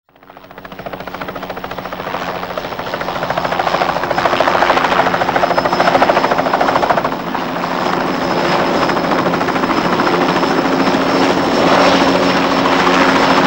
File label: JetRanger Landing; Santini